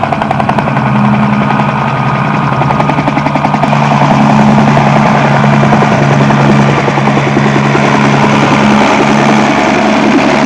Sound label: HELICPT; trasporti; elicotteri